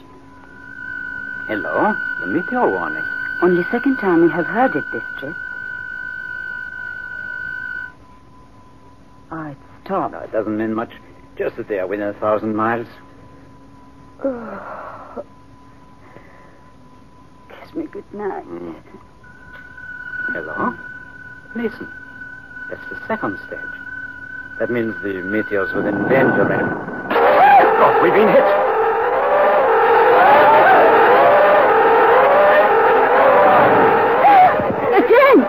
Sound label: Meteor warning and strike